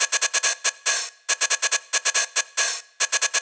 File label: Loop 140 BPM; Vengeance Samples